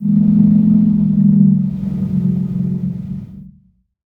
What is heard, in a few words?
Whistle; moul